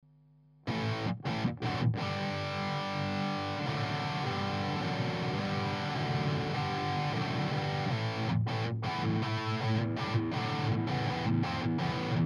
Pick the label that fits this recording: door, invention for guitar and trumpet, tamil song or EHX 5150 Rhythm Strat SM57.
EHX 5150 Rhythm Strat SM57